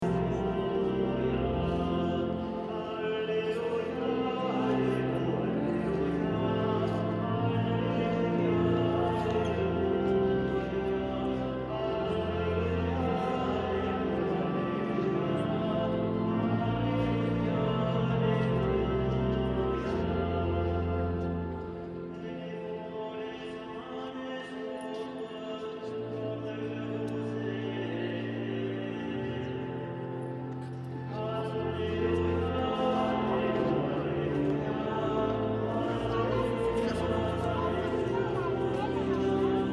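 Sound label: church singing, Catholic church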